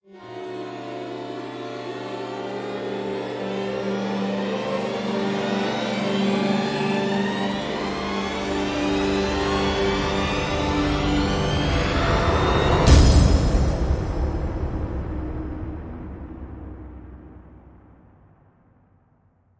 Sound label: Trailer Riser